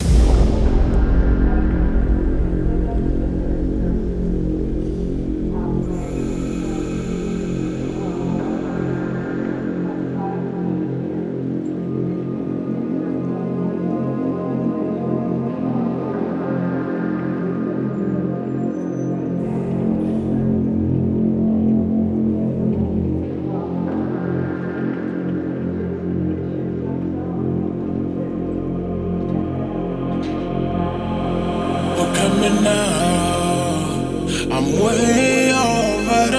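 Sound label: BASS CLUB car audio loud front; Gromkij front 166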